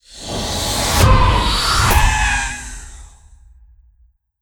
Ghost Spectral Explos

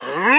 Shield Prepare; Pecheneg

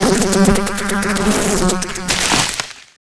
Bee Die

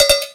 Sfx Winter games

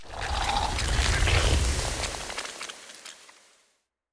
Enchant Absorption Spell

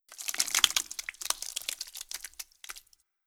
Pirate Moovegore

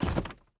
Big Man Walk

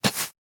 Arrow Fly; Folk Hero

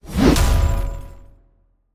Boar Strike Attack; Legend of Keepers

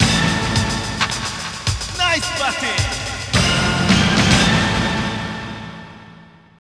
Str G#; Pro Yakyuu Spirits 3 (PS2) (gamerip) (2006)

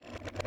Audio Log Player Stop

